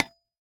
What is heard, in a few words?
Dragon Lead Hit